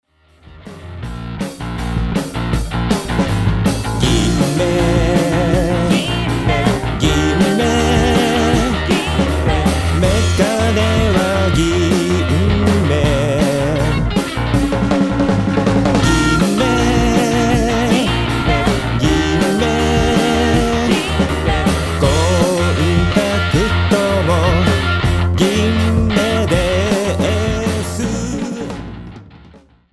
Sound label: rock